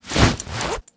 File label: jeans zipup; Tony Hawk's Project 8; XBOX; bedroom